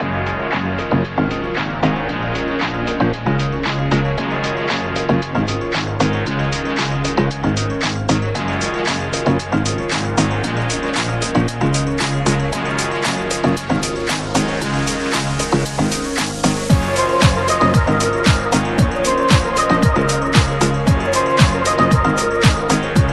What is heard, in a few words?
electro dance